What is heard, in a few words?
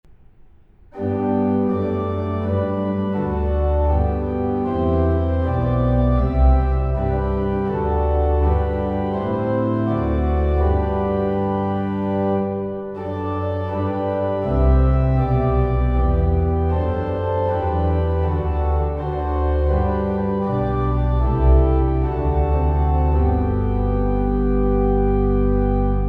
gloucester, hymns organ